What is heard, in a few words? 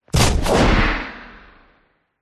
sound design hit whoosh into punchy crunch hit with a long tail